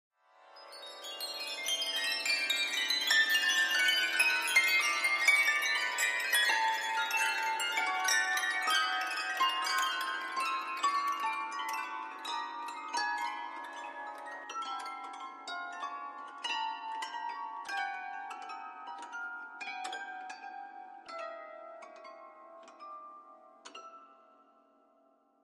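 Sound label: Grand Piano Strings High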